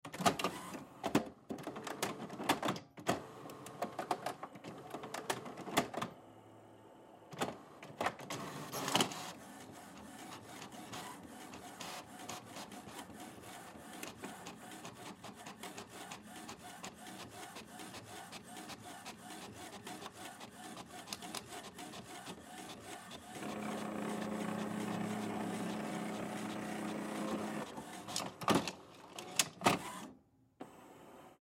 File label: Printer Copy Machine Copy Document, Hewlett Packard; Office; Printers; Blastwave